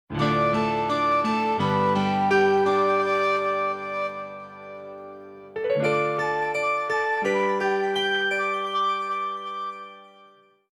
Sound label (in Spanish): Obtener item theme; Flat Kingdom Papers Cut Edition